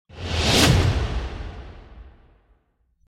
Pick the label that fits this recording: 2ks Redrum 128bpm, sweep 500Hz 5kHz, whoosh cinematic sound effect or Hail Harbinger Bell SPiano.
whoosh cinematic sound effect